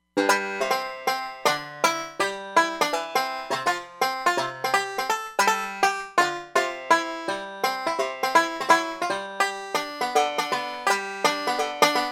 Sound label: Banjo